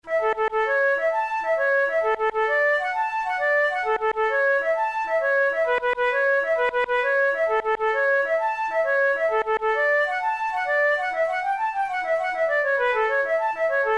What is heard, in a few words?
banjo breakdown, flute